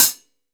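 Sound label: MIX closed hi-hat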